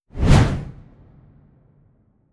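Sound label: Fire Elemental Inferno swoosh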